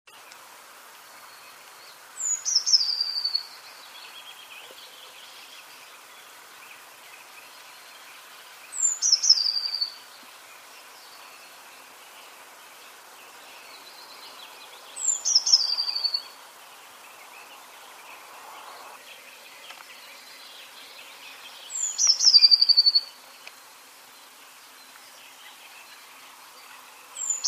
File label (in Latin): Cyanistes caeruleus